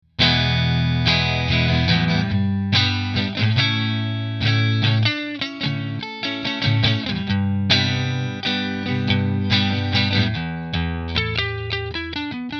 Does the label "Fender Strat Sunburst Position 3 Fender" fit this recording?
Yes